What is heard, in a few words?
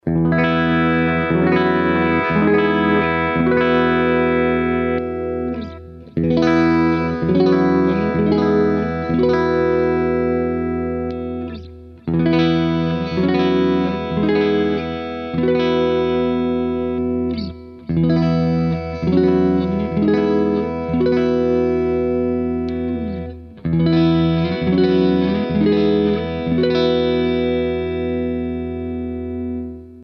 Clean all pickups